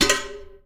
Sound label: LID, household; AKAI Anime Sound FX